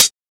Studio Pedal Hi-Hat Single Hit A sharp

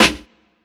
verby snare single hit a sharp key; snare drum samples

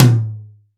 acoustic tom drum one shot a key

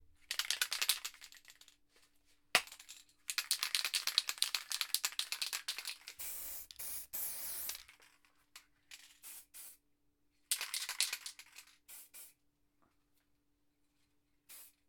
shaking and pulverizing from a spray paint can; sound effects

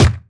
studio steel snare drum sample a sharp key

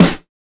snare sound f key; snare-drum-samples

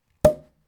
beer popping cork

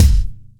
crisp kick sound c sharp key, kick drum

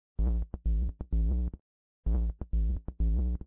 Jumpy tech modular bassline Am; loops

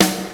short snare drum f sharp key; 90s

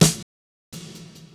roomy snare drum sound E key

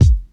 perfect hip hop kick single hit A key

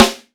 crunchy snare drum sample A#

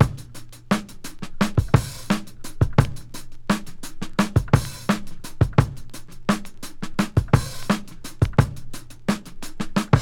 88 bpm high quality drum loop f sharp key

